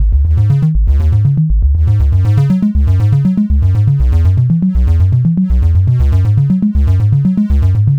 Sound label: Synth Arpeggio, Synths; Eclectic Electronica